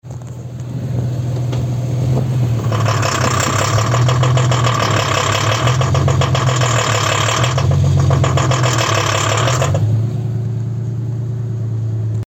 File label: bad engine noise overheating, car noise; engine drivetrain and forced induction